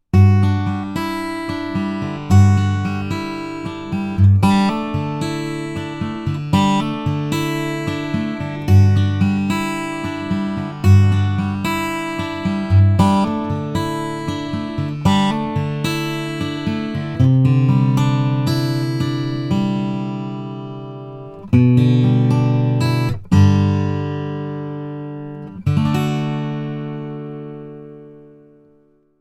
Transakusticheskaya gitara Natural

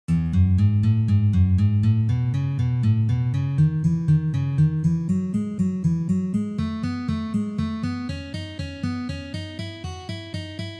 Uprazhnenie dlya igry gammy Mi mazhor

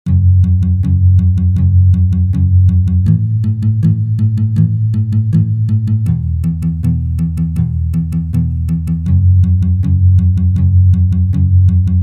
Palm Myuting Palm Muting

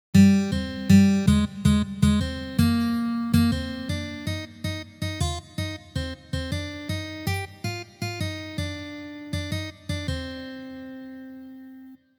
solo na gitare

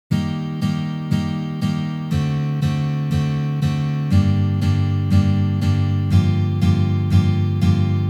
Uprazhnenie s akkordom D7

